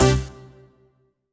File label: HOUSE, House Dance